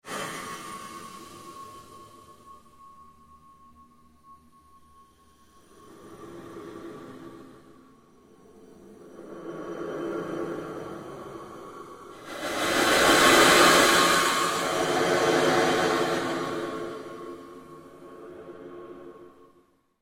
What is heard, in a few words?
pulse long sweep, Sound effects created by manipulating other sounds; Rise And Dive; Rises and Dives